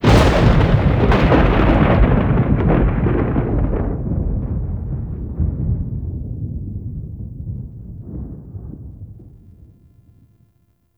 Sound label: Plains Thunder Clap; Warframe; Eidolon Plains; Ambience